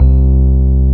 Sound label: Rhodes C2; Best Service Gigapack 1